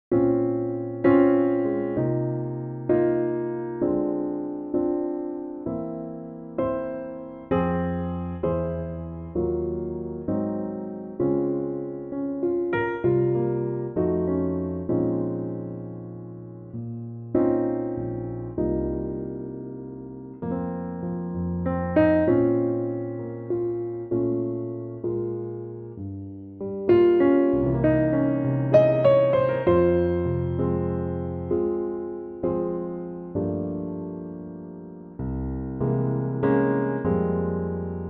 Db piano

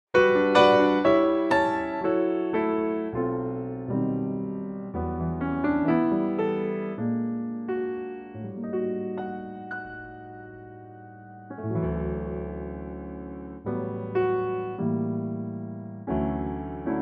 D piano

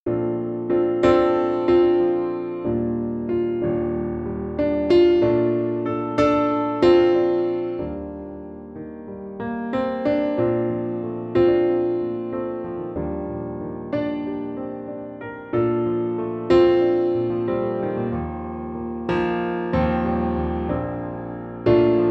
Bb piano

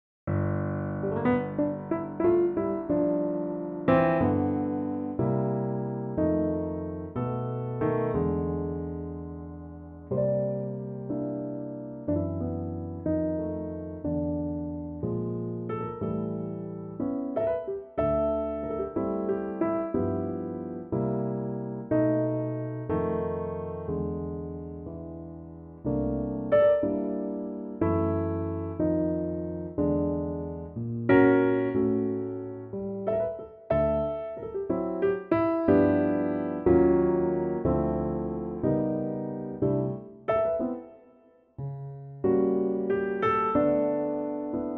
piano

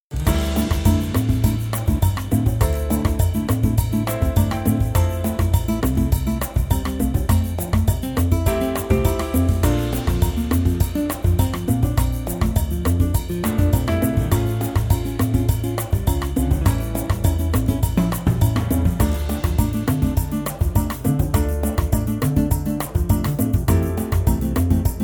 Dm No Organ

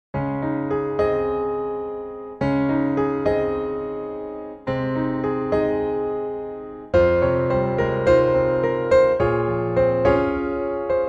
Ab piano trak short

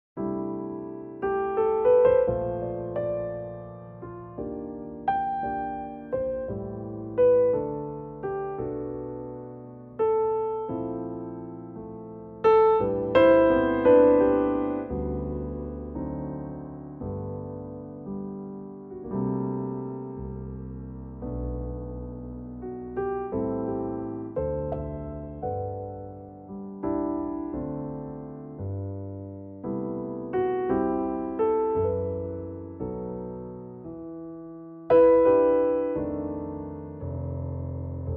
G piano

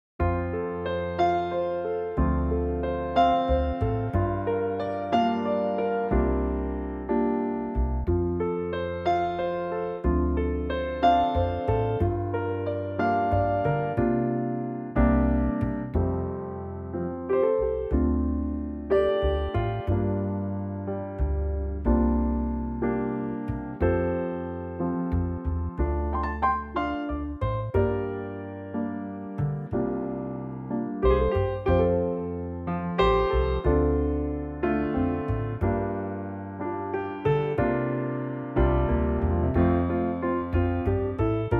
piano bass

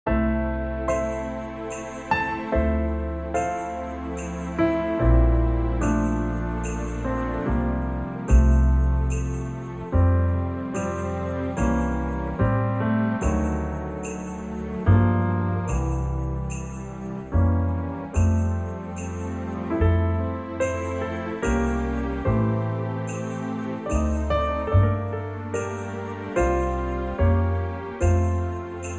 O Christmas Tree F strings

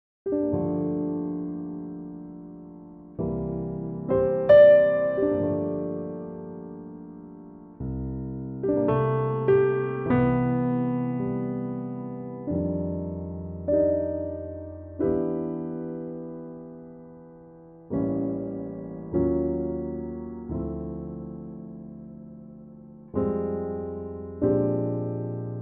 C piano

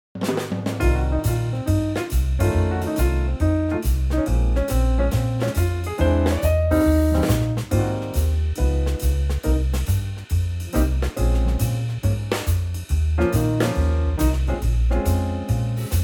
Ab swing

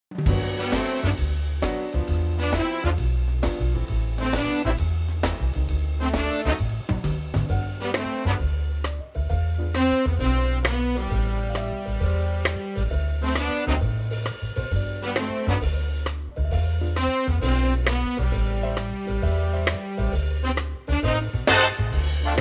swing

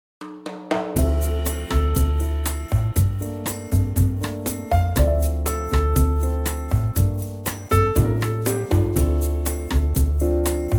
C bossa

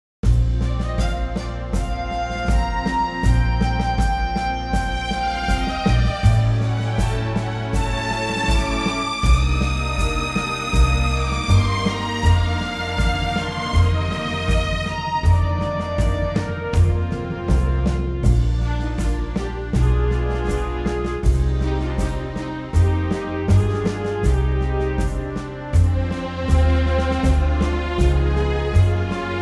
orchestral

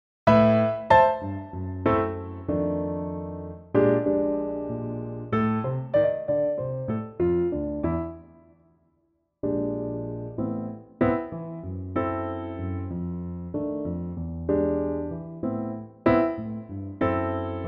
Am piano

